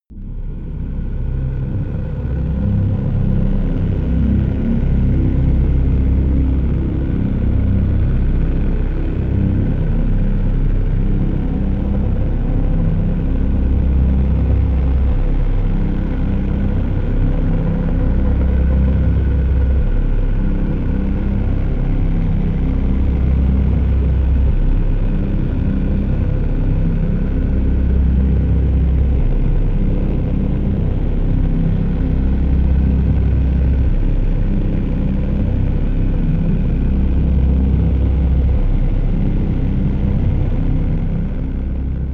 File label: drone synth-bass clatter